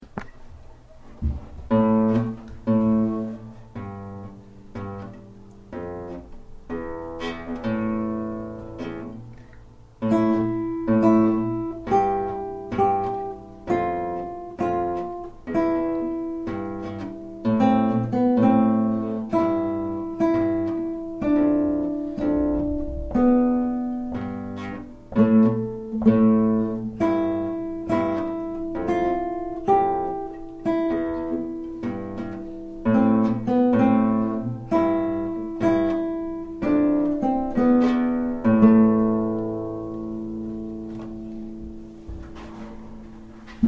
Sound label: cool cat bass